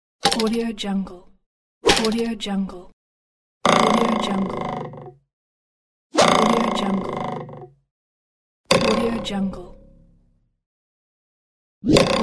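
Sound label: vibrating wood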